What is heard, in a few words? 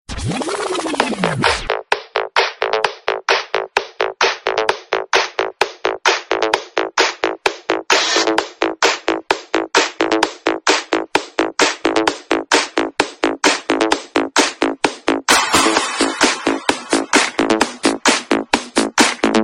remix dance